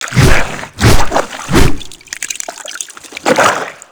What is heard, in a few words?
Infested Ancient Small Arm Stun Flesh; Warframe